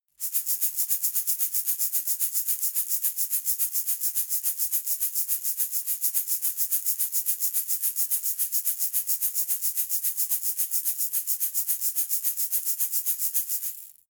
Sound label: shaker plastic egg rattle slow